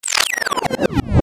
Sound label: Descend Gapper Power Down; Descending Sound Effects; Blastwave